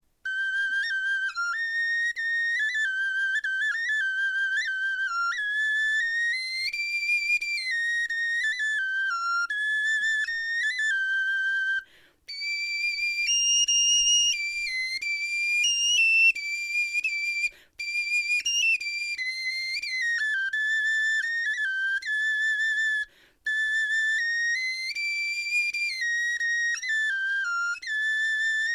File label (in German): melodie, knochenfloeten